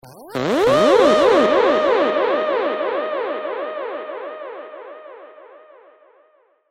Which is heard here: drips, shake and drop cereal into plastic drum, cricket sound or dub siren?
dub siren